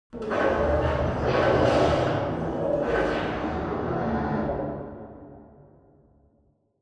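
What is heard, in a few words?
Robot Arm Receive Distant; Boomtown; Titanfall 2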